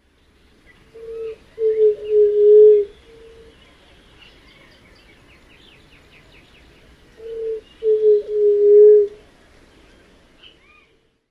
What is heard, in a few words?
songs